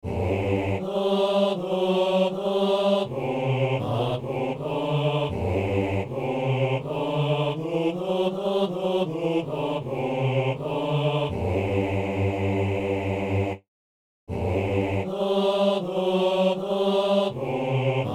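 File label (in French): la voix qui crie dans le désert, basse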